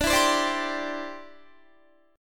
D 7th Suspended 2nd Suspended 4th, D, down, Guitar; strummed; 4E,4A,5D,5G,5C,6F; chord